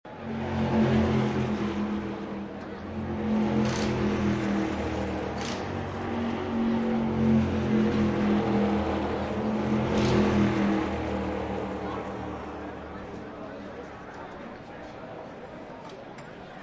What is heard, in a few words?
garage; Cars